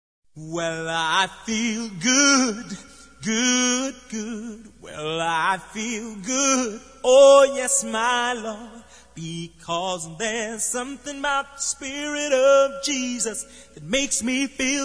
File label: Acapella; Internacionais